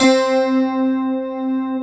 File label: FM ELP 5 C3 R, Keyboards Old School; USB Soundscan vol.09; AKAI